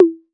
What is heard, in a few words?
808, CONGA, Electronic